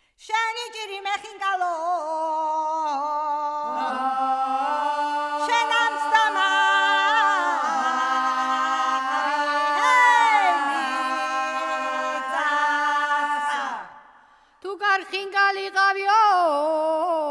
sheni chirimo xinkalo sufruli tianeti; Mzetamze

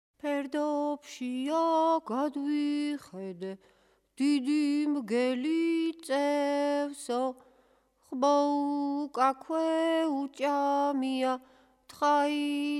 bavshvis shesashinebeli roca ar izinebs an ar chams racha; Mzetamze

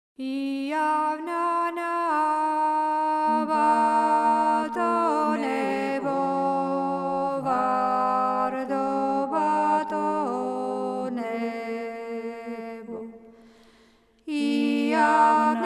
iavnana rachuli samkurnalo; Mzetamze

